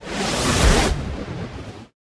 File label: spell destroy armor; archer